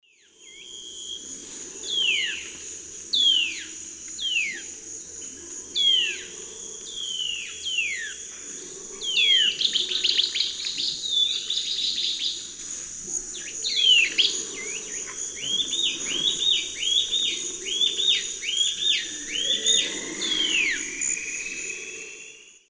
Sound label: Hylophylax punctulatus, calls and songs 2 birds; S. America; Eastern Lowlands